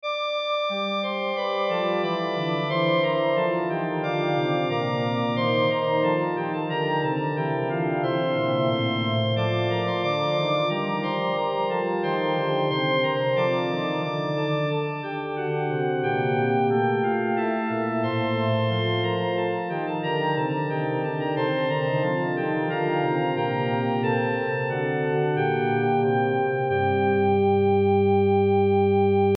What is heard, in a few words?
organ